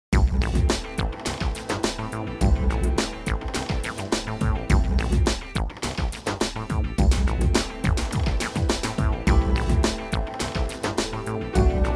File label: Gothic Groove